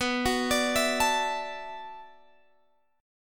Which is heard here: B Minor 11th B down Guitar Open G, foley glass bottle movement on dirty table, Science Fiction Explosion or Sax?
B Minor 11th B down Guitar Open G